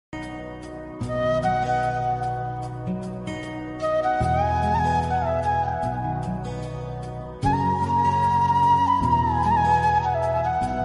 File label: Flute Ringtone